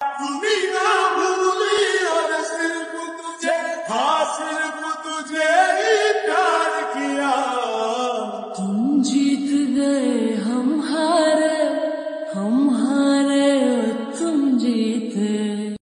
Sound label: Lofi Slowed Reverb Hindi